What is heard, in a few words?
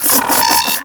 Arachnoid Wraith Vocal Web Charge; Warframe; Corpus